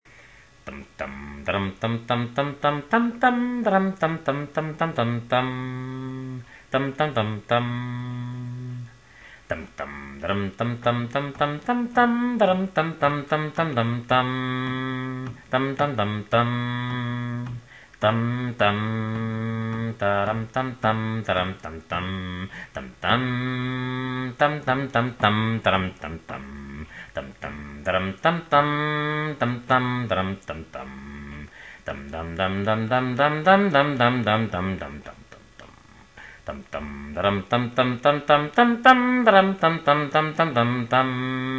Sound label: instrumental unknown guitarr string pizzicato